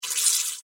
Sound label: Device Movement, Door, Panel, Open, Scifi; Science, Fiction; Tech; BlastWave